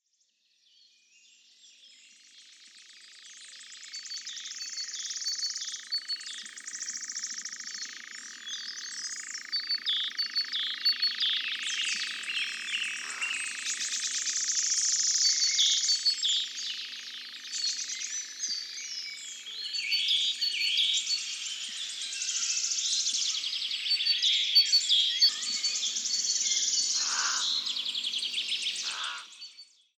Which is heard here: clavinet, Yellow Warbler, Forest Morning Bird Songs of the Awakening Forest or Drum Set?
Forest Morning Bird Songs of the Awakening Forest